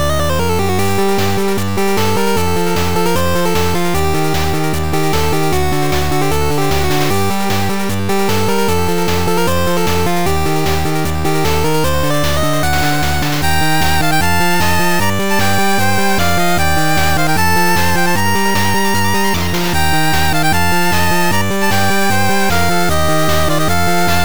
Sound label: 8bit Sword Fight